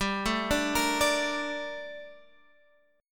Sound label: Bb Major 7th, G; Bbmaj7/G arpeggiated